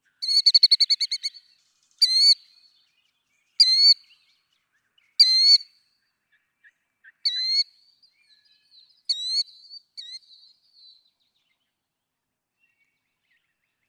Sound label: Killdeer